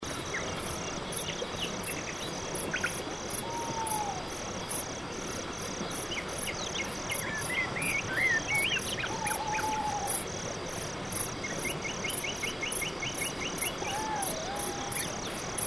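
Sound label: night forest, nature sounds; ZVUKI PRIRODI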